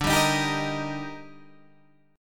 D sharp Major, Guitar; D#/D strummed; JGuitar

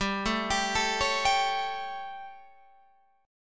G Suspended 2nd Flat 5th G Guitar Open G

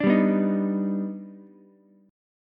Eb Augmented Major 7th Eb down Ukulele; Eb+M7 strummed; JGuitar

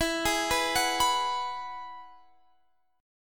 E Major Add 9th, Guitar Standard; arpeggiated; JGuitar

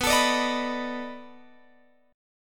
B 7th Sharp 9th, down, Guitar, Open F; B7#9; strummed

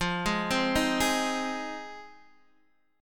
F Minor 7th, F, Guitar, Open D

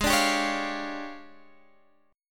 D Minor Major 7th Double Flat 5th, G sharp

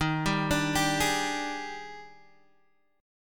Eb Major 11th, Guitar, Standard; EbM11; arpeggiated; JGuitar

